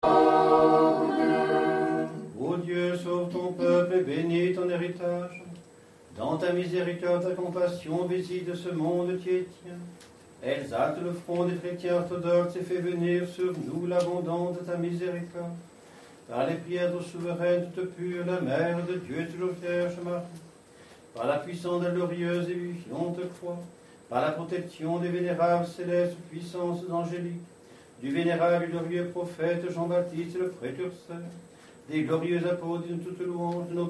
O Dieu sauve ton peuple, Matine de la vigile orthodoxe

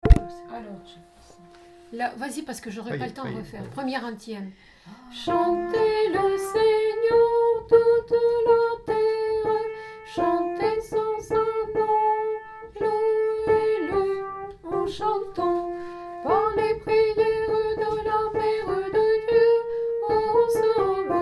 Paque Antienne Soprano

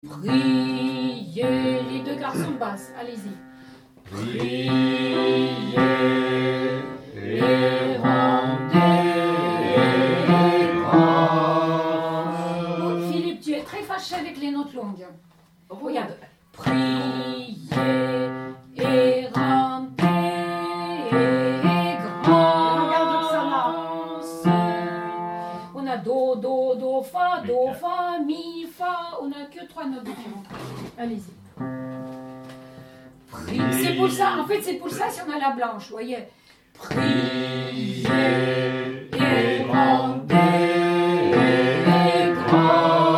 basse; Prokimenon (mélodie alto)

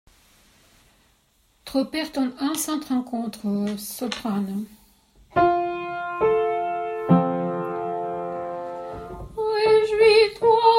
Tropaire sainte Rencontre Ton 1 Soprano

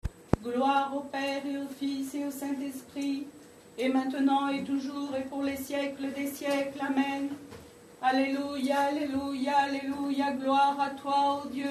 Hexapalme gloire au Pere Vigile orthodoxe

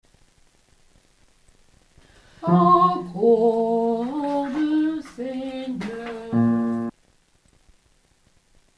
basse

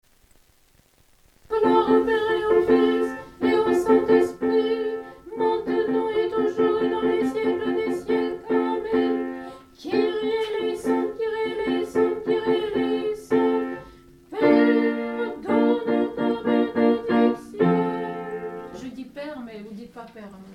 soprano; Gloire au Pere

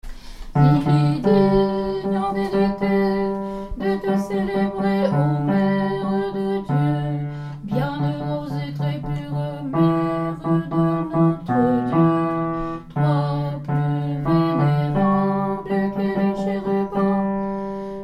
hymnne A la Vierge basse

